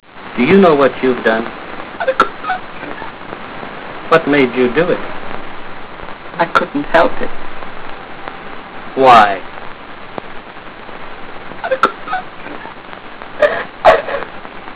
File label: cry